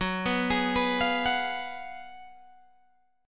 Gb Major 7th Suspended 2nd Suspended 4th Guitar Open G